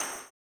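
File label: tambourine, 160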